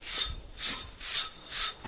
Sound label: slinky rhythm, drum loops and breaks